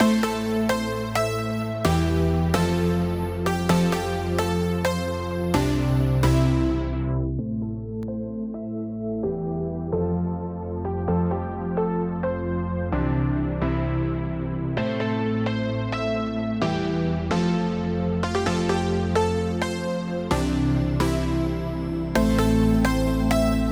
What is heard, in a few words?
Main Synths